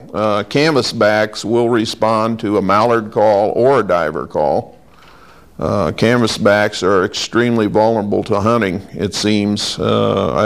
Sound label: canvasback, Duck Calls